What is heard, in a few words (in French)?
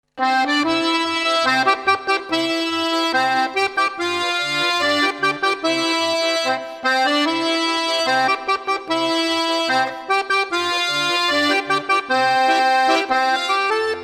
Fete au village Soliste